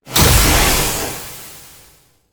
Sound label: store spawn int; Original TK Sounds